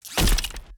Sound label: step; tungtung phase2